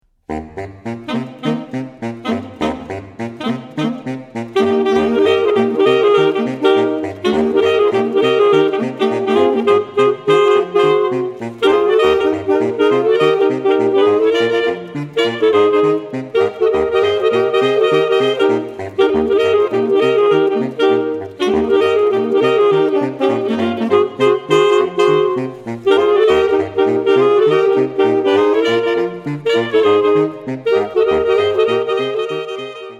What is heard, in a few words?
Boogie Woogie Sax Quartet